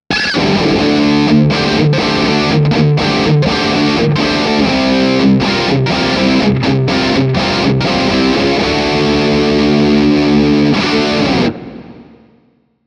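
Fat Rock, Fat and heavy, Overdrive + Distortion, chitarra elettrica Kramer; Bridge pickup; OD Drive: 3/10; OD Tone: 7/10; OD Volume: 7/10; Gain: 7/10; Tone: 6/10; Middle: 7/10; Presence: 6/10; Volume: 4/10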